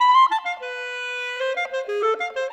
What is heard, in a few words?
Clarinet; Sonic Foundry (Sony Creative Software); Orchestral VOL-2; Modern 29